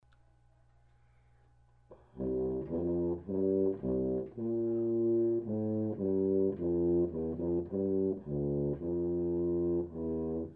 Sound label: theme tuba; ff7; fftheme